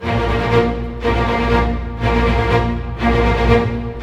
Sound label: 120 snare, army; East West; Scoring Tools; ORC